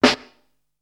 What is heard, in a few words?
bucket, snares; Zero-G - Funky Elements